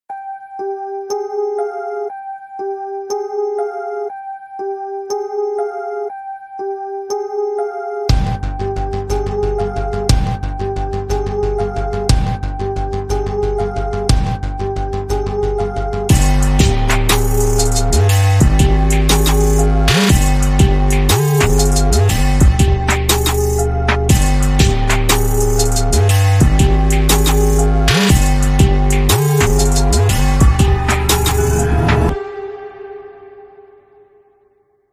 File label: samsung Galaxy Note 20 Ringtone